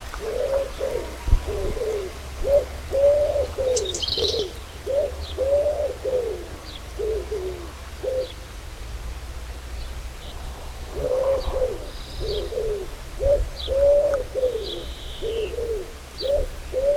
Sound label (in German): ringeltaube